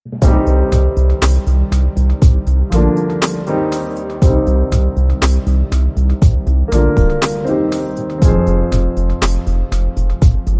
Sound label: Piano Swag Short Rhythm